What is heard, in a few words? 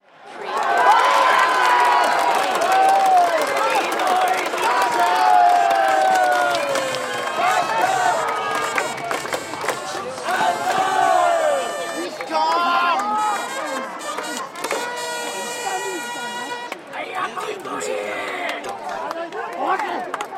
Tokyo baseball crowd cheer Japan; Swallows; lynne-publishing